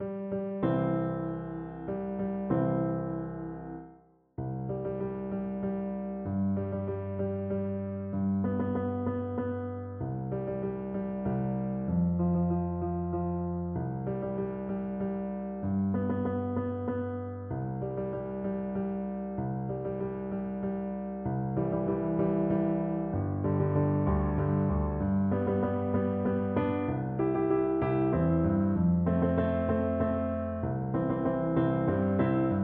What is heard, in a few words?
Cello